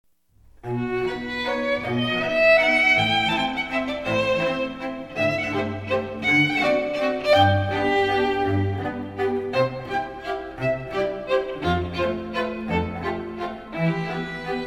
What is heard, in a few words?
Trio of 2 Violins and Cello; Bizet, Seguidilla from Carmen